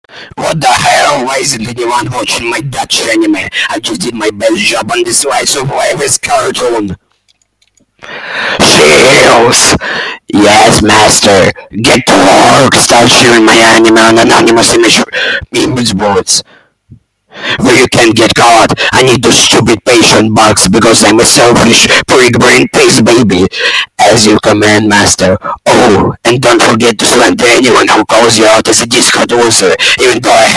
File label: Studio recording